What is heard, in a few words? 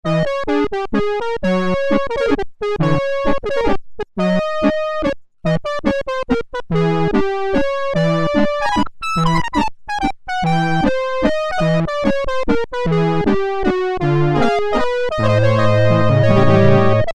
Reedy Organ, Roland Juno-106; Factory Preset